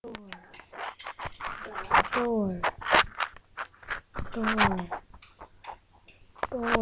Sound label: door